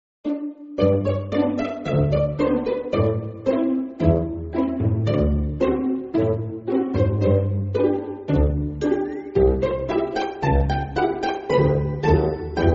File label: music; dino